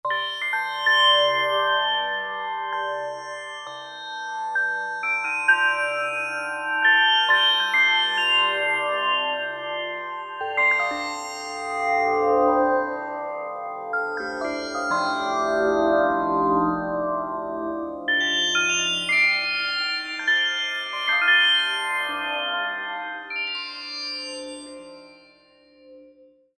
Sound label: MAGIC BELLS; Akai SG01v